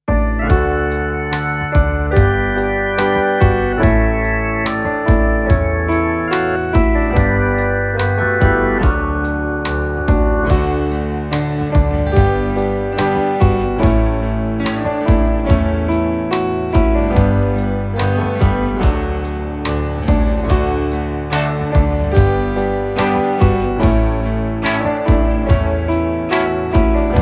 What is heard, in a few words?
Instrumental; Kids Liturgy; Year A